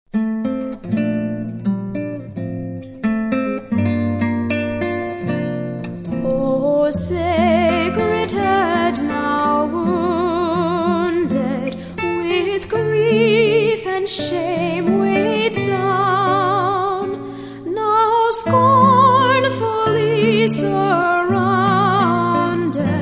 Singers And Orchestra

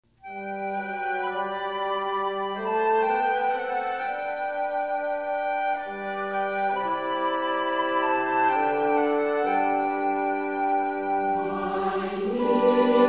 Orchestra and Choir, Hymns

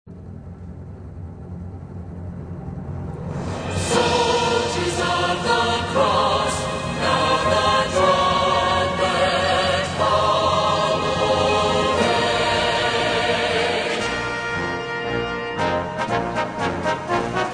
Choir And Orchestra

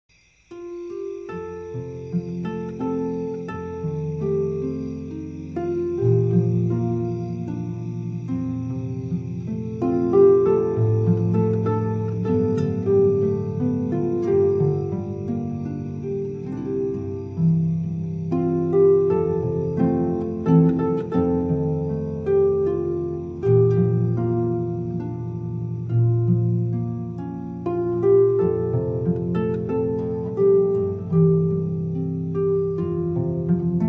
Harp

